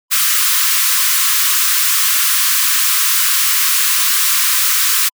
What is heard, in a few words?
noise HF